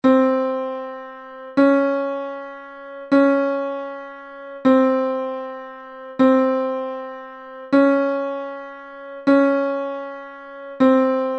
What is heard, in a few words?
Chromatic Trainer F small range, bansuri